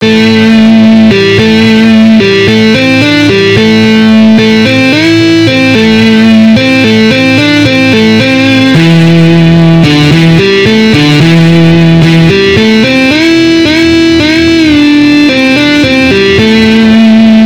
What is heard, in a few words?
Guitar solos both